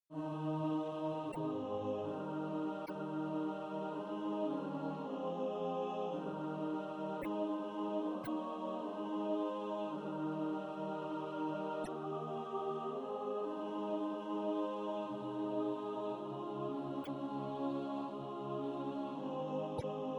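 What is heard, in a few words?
alto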